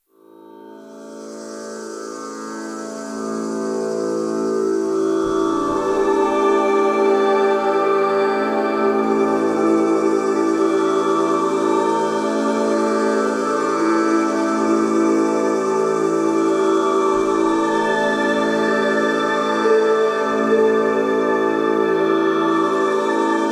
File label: Massage, Myths & Cicadas